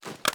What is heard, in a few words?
ar reload fast dmr mag in; weapons; gmod; viper; scharlie